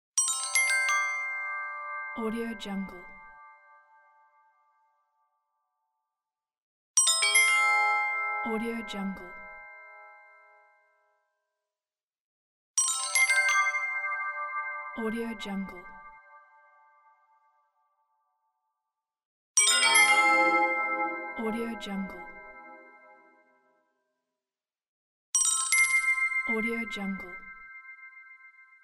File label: magic crystals; volkovsound